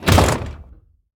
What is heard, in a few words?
metal double doors close; Devour